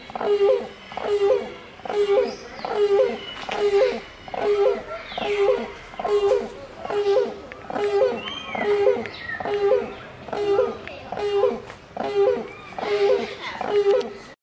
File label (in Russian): vencenosn zhuravl